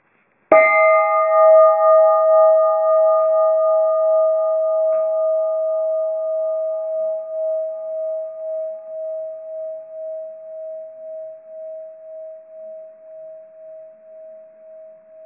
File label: Transylvania bell